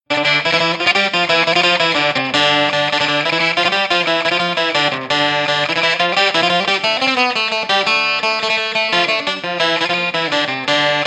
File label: irish bouzouki folk traditional amp sequence; guitar; loops